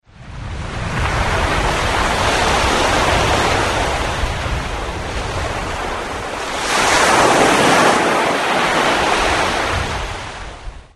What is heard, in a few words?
kotogahama wave; 鳴り砂